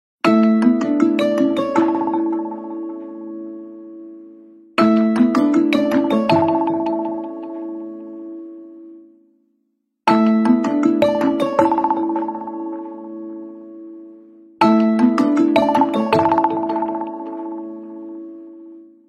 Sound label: argon; nokia 9 pureview